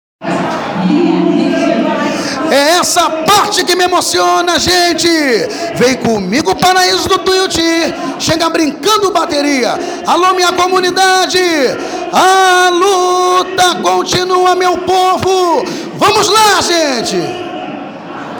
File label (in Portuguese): grito